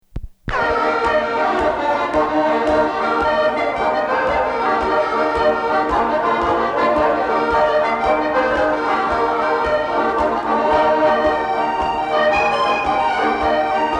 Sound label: Jig in D